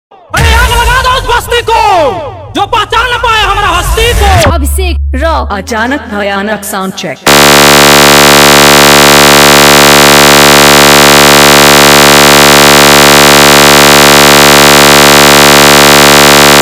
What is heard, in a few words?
Competition Faadu Bass Remix